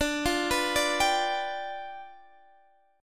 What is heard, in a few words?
G 7th D